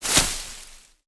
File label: Light Grass footsteps; gather-and-defend; UltimateSoundFXBundle